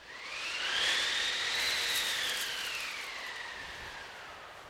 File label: wind